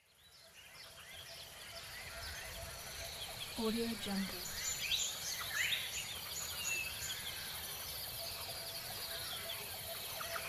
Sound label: savannah ambience